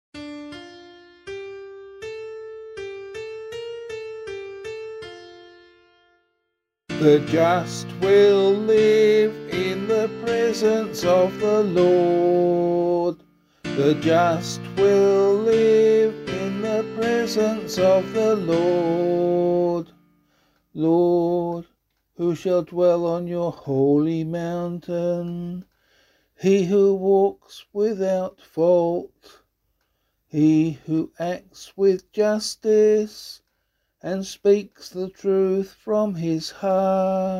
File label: Psalm C vocal